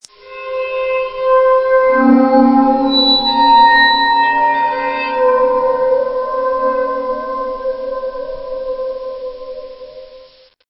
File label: waterphone